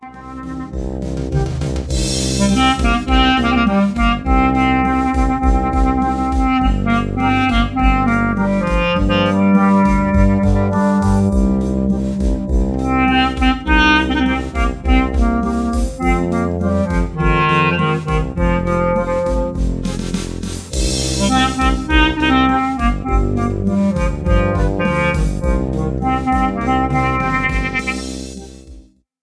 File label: harmonic